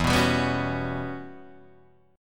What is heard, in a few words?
E Minor 6th Add 9th guitar chord, Open D